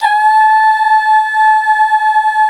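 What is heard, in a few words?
Duh Female; Best Service Gigapack 1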